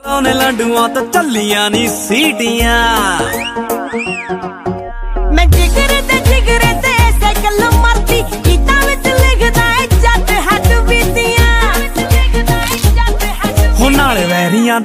romantic ringtone; bollywood ringtones

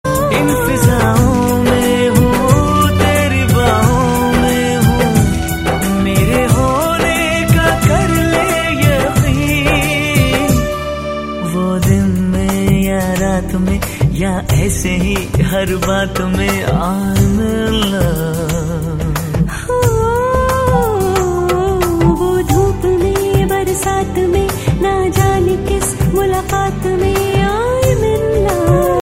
bollywood song tone ringtone

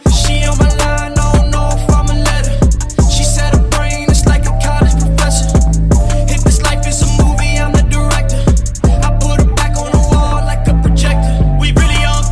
english ringtone

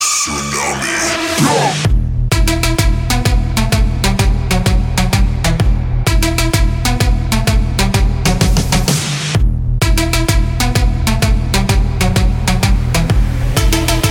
dance music call tone

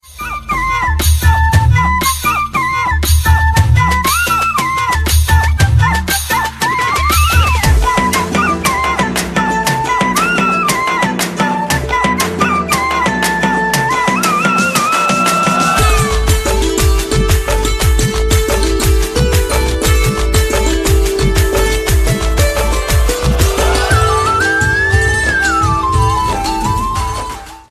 modern flute ringtone nokia; nokia ringtones

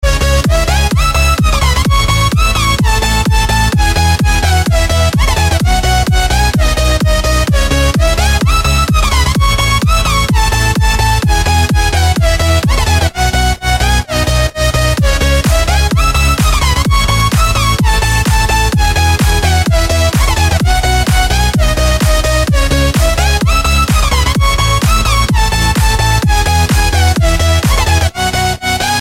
instrumental

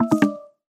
battery notification ringtone

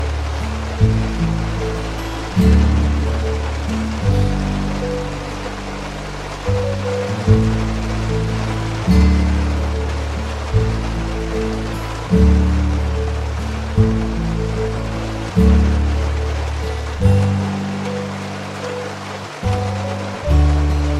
mindfulness music ringtone

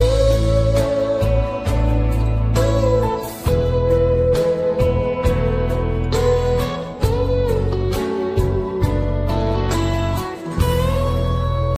soft instrumental hindi ringtone

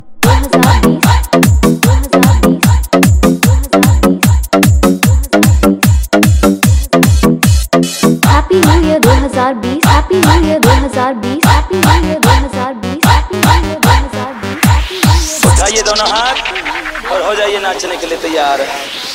dj remix ringtone; happy new year 2020; bollywood ringtones

